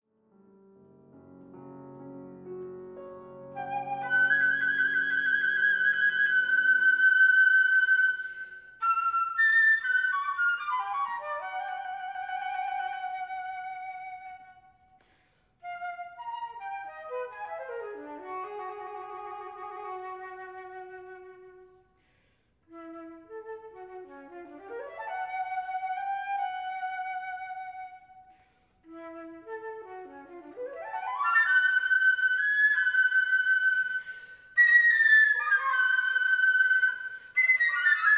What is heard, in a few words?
cadenza